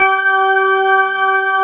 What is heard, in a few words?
ROCK B3 D, HAMMONDS; East West; Global Sounds